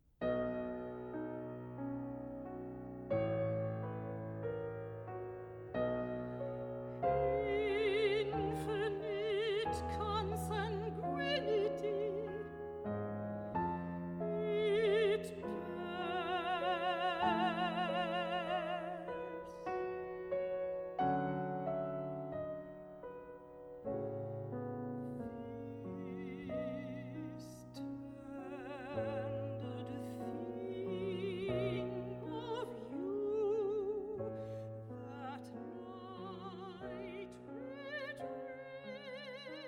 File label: piano